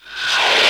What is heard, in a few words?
Short Fills & Reverse; Vengeance Samples